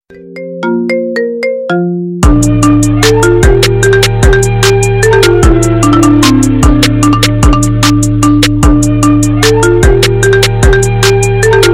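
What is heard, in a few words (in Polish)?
iphone, dzwonki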